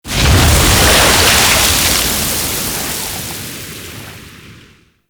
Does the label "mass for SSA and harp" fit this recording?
No